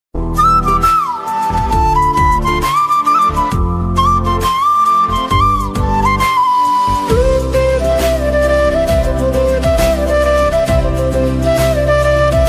instrumental flute bgm ringtone love bgm ringtone

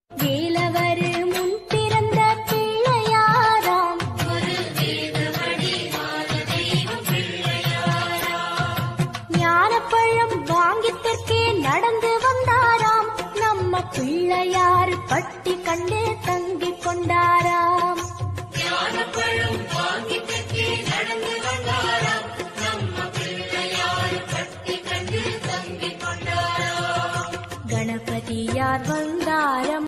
Ganesha song Vinayagar song in tamil

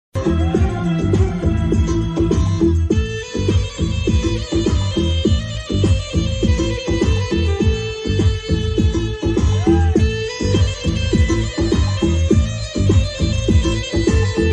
hindi music ringtone best romantic ringtone

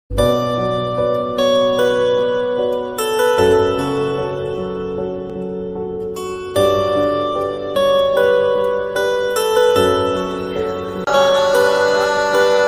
sad background music ringtone; mithai; mithai love ringtone

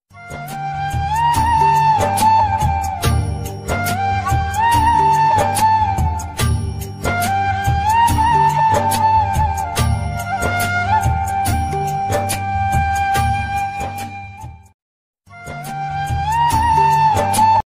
instrument song ringtone

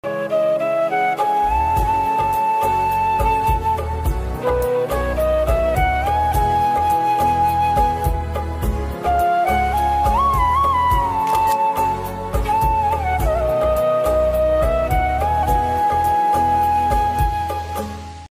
instrumental flute ringtone flute ringtone; ishq murshid drama

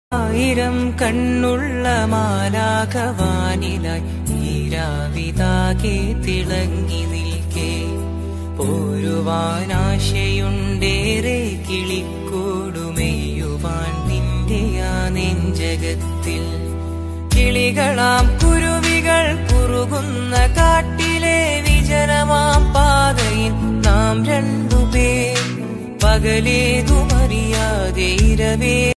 malayalam album song ringtone love song

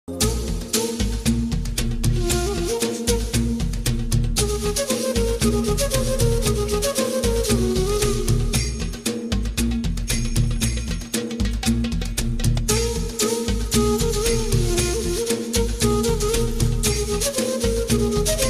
bgm, flute music; samurai; actorvikram; tamilcinema

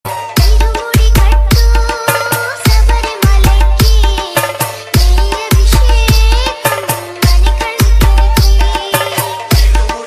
bhakti bgm ringtones; ayyappa swamy

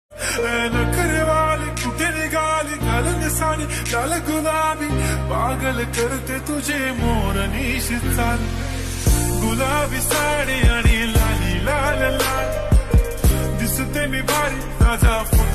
ringtone new trending ringtone song marathi song

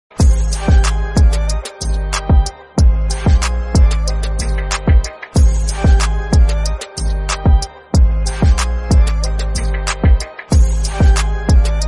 song instrumental ringtone

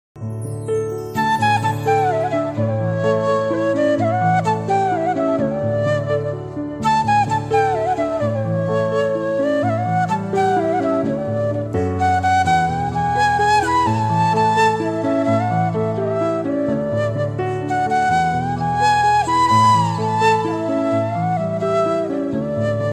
malayalam love bgm tune mobile ringtone

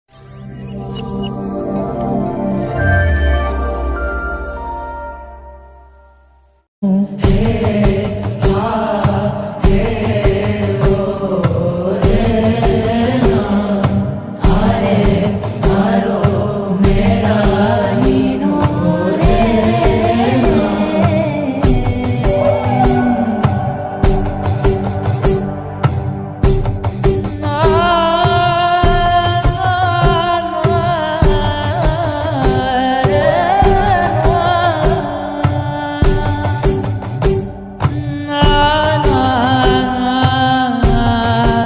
song ringtone bgm tone; kantara

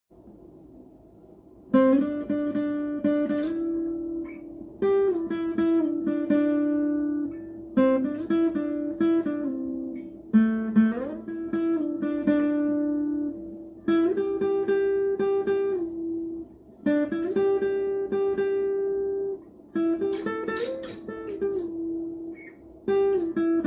harivarasanam instrumental; ayyappan

